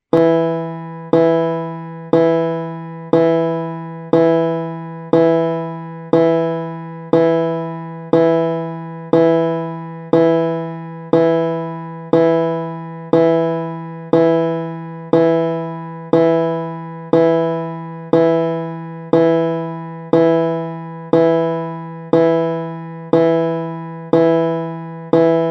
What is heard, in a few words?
E3 Banjo